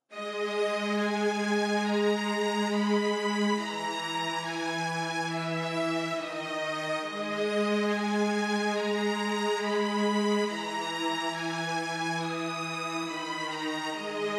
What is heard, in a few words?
139 BPM G# Min Violin High; Melody; Loop Stems; Drill; Cymatics; 2022 Melody Collection - Drill; No Involvement